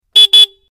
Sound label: Vespa Horn